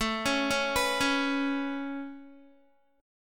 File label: A Major Add 9th A Guitar, chord; Aadd9 arpeggiated